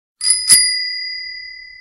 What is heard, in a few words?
bike bell sound